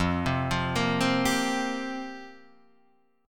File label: F Suspended 2nd Suspended 4th F down Guitar Half Step Down